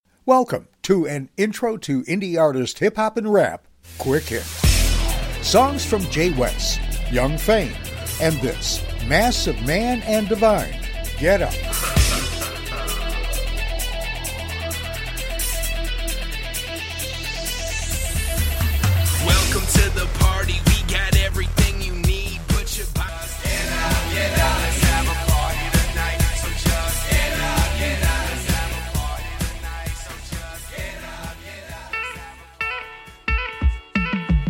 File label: hip hop song